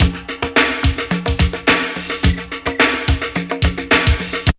BREAK; ZERO-G; Datafile

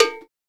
CB

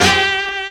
HORNHIT, HORN STAB

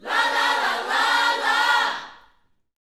Vocal Gospel PHRASE

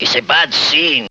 VOX

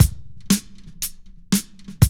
LOOP; E-Lab; X Static Goldmine 2; akai

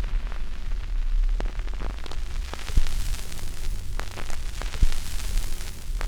CRACK, CRACKL FX; E-Lab; X Static Goldmine 2; akai

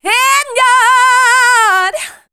voice spectral, ly short

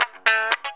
GTR FX; INSTR LOP; E-Lab; X Static Goldmine 2; akai

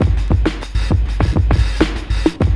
LOOP, DRUM; E-Lab; X Static Goldmine 2; akai

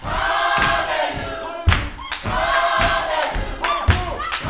YOUTH CH PHRASE, Vocal Planet Gospel

